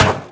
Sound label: Pickup Junk; Cars; XBOX